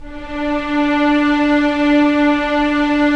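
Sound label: STR SC, string section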